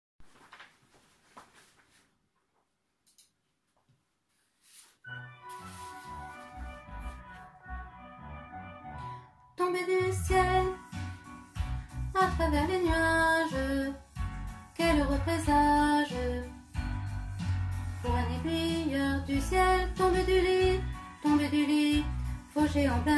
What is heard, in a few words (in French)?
basses; Sequence; 2 couplets